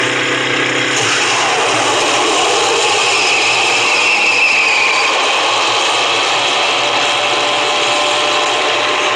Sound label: NITROUS CAR; Mad Max